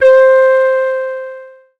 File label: Noises Whistle; Synthetic's Serum Collection; Production Master - Vital Future Bass 4